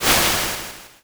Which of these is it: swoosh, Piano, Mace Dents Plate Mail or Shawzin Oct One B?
swoosh